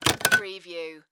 Stapler Industrial

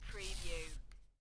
Poker Chips Stack Of Chips Slide On Felt

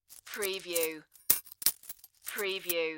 Coins Moving in Hand

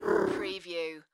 Cat Meow

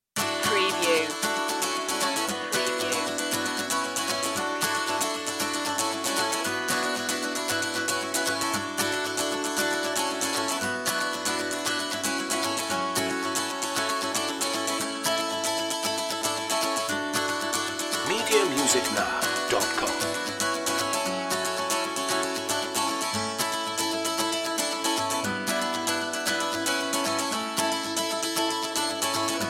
acoustic guitars

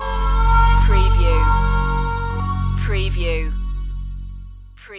southern pain

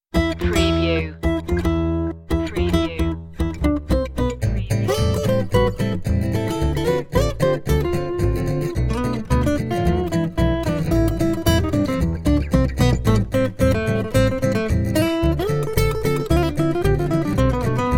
guitar and bass only

